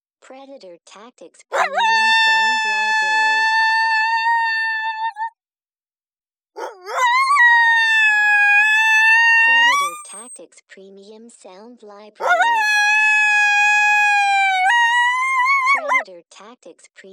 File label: HOWLS